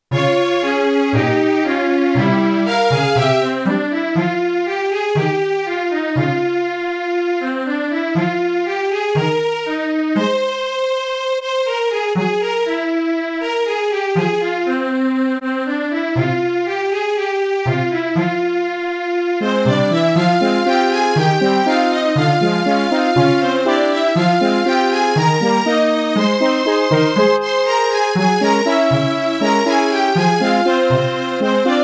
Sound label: microsoft gs wavetable synth exercise; the roach empire